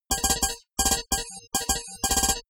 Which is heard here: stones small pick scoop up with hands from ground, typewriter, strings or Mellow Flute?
typewriter